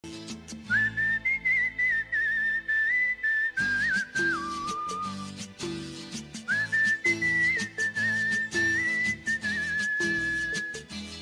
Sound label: whistle